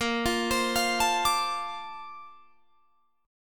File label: A sharp Major 9th, Guitar Drop D